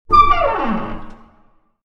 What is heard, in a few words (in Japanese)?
tobira